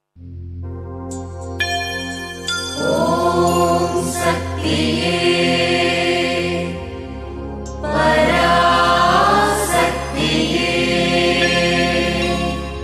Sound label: Mantra; Nool Moola Manthiram